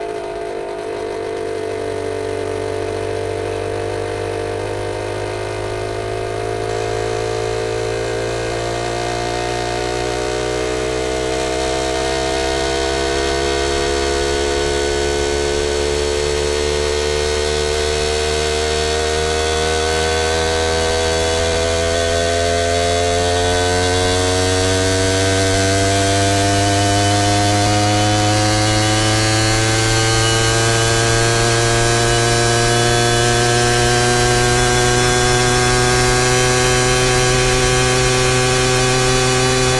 keeway onboard drive speedup slowdown shutdown engine; sonniss gdc